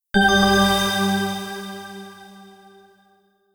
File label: Jingle Skill Learned